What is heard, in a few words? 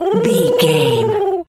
pigeon call angry